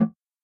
button; ovo

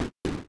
craft; adarkroom

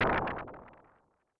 asteroid hit; adarkroom

